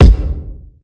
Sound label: strong bottom end kick drum g sharp key